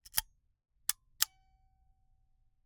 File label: Camera, Argus, aluminum, steel, professional, fully mechanical, advance film, snapshot; Eiravaein Works; Vaeyan IV